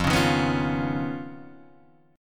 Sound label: Eb 7th Suspended 2nd Sharp 5th F